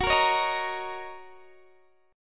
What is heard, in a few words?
F sharp 6th, down, Guitar, DADGAD